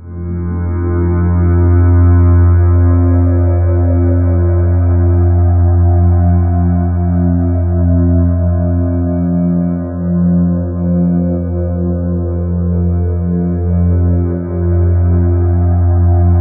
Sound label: SPACE, Ominous Drones, SYNTH SND; USB Soundscan vol.48; AKAI